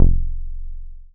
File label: Bass SH-101